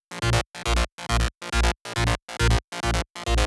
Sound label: Bassline 138BPM, A#minor; Vengeance Samples; Fusion Kit